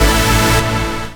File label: chord A; Techno Trance Essentials; CHORDS